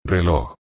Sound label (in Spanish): reloj, esp